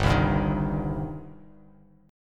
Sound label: G Suspended 4th Sharp 5th, Bass Standard, Gsus4#5 chord; strummed; JGuitar